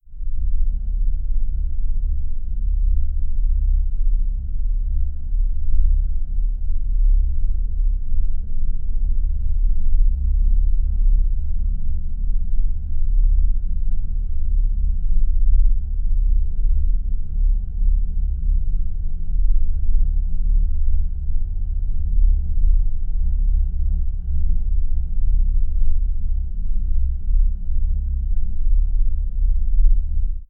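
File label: low quiet sound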